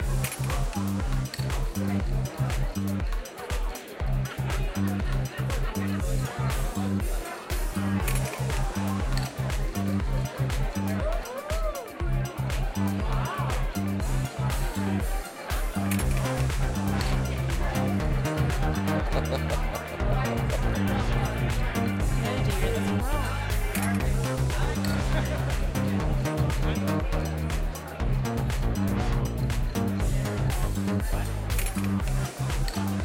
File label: Disco